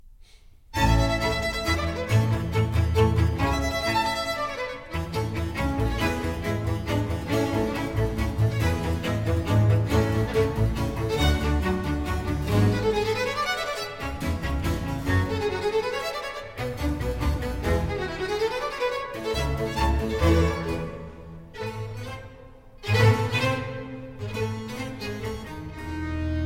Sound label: concerto for cello strings and basso continuo in b-flat major; h 436